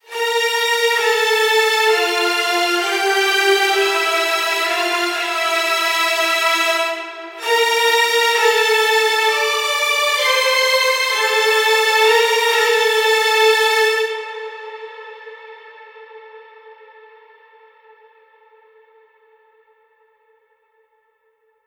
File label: String Section Melody - Dmin - 130Bpm; Construction Kits; STEMS; Ghosthack; SkyRealm; Kit 24 - Dragon Mountain